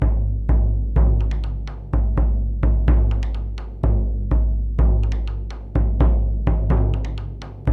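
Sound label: 125 bpm, daiko, low drums; Spectrasonics - Supreme Beats - World Dance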